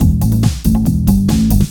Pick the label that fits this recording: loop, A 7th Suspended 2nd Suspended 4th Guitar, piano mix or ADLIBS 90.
loop